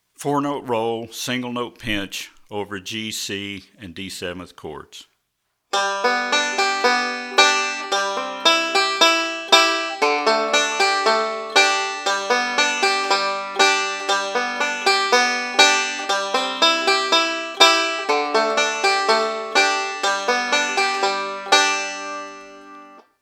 Four-Note Roll With Single Note

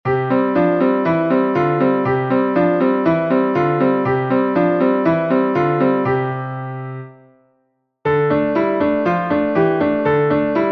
Piano Strong Hand Alt Thumbs; Warm-Ups; Sound Prisms Piano Warm-Ups 2

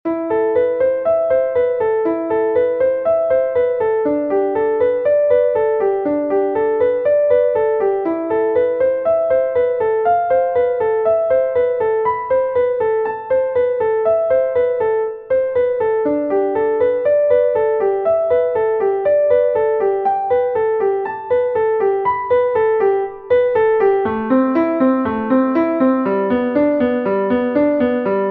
Piano; ABRSM; Grade 2

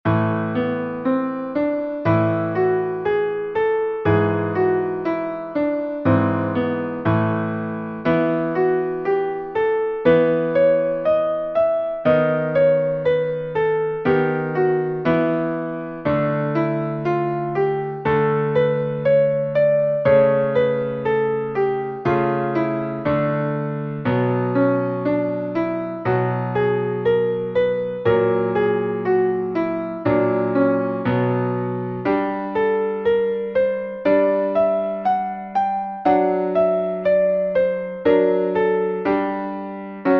Minor Scales Melodic Minor; Minor Scales 4a Melodic Minor

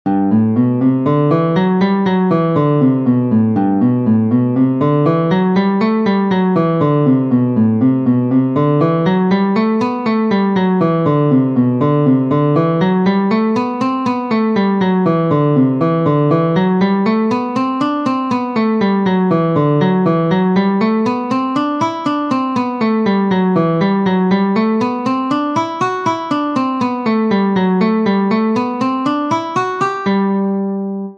Scales and Modes Guitar G; Scales and Modes: Guitar G Major

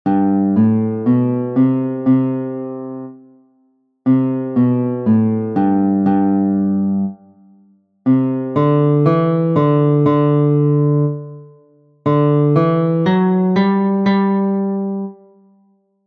Guitar Scales Walk Ups And Downs; Guitar Scales: 1-Walk-Ups And Downs

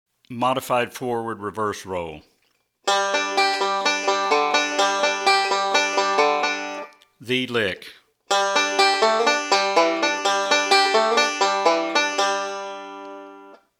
Modified Forward Backward Roll F

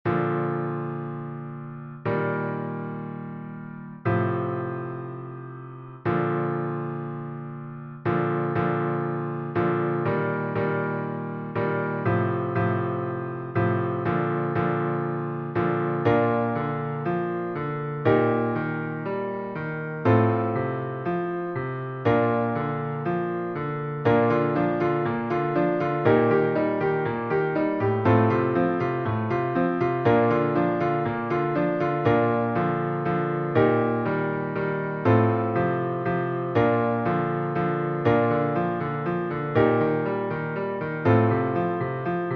Chord Structures And Rhythms - Bb; Chords Packet 3

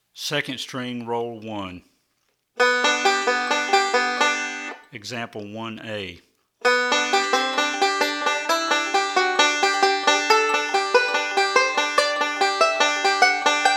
Rolls For Melody On 2nd String

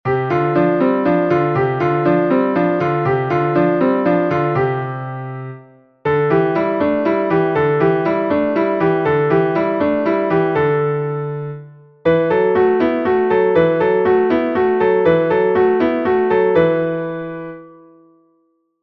Fourth And Fifth Finger Exercise, Piano

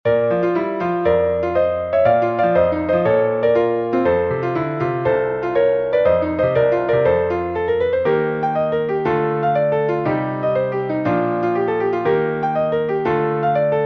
Jazz Exercise

